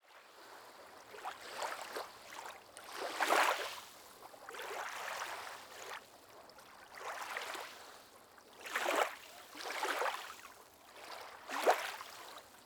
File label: Lake Pier Small Waves